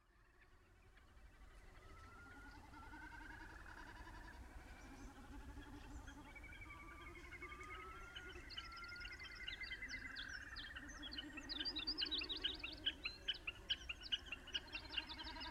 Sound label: Dawn in Stafholtstungur; midsummer recordings were made around four o´clock in the morning 15th of July